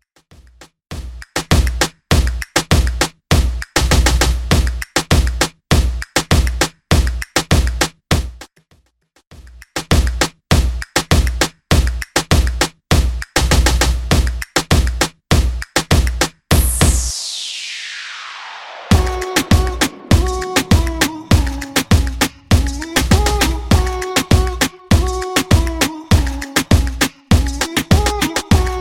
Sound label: Top40 Moombahton Mixshow Mainstream; Edit 100 Bpm Dirty